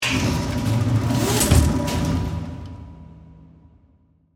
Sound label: Machine Press, Scifi, Machine, Press, Sequence, Single, Press, Science, Fiction; Spacecraft